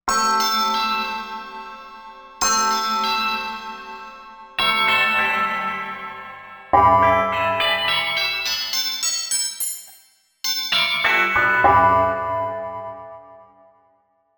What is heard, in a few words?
Tubular Bell